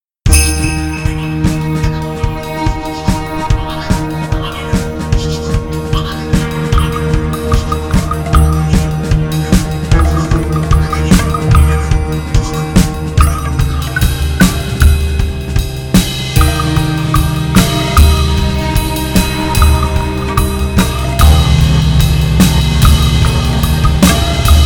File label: Rain